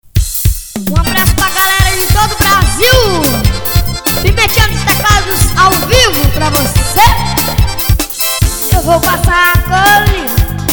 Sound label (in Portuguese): forro promocional